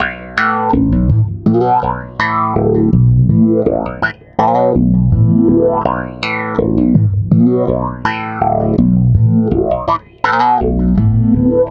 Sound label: Stick Loop, Stick Bass